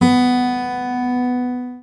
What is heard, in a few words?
GUI Ac., GUIT AC; Best Service Gigapack 2